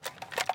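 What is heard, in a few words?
turret turn machine gun light start, tanks; War Thunder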